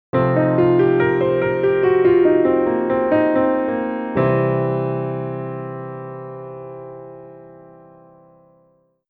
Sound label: blues scale